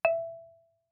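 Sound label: Marimba cord fortissimo E5; MIS Pitches - 2014; Percussion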